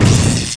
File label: shock fire, weapons